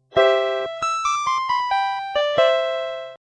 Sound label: jingle